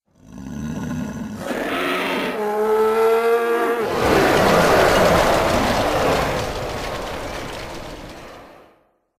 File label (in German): Oger Schrei